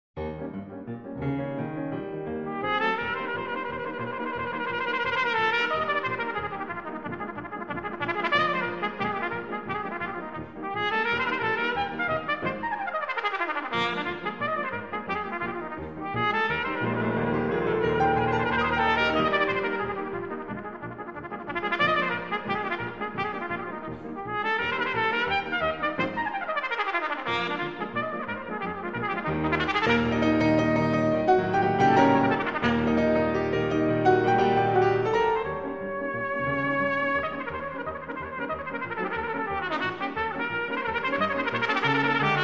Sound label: Hora staccato, Solo Trumpet; Dinicu; Virtuoso Trumpet